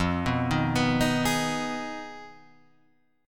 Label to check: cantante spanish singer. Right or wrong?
Wrong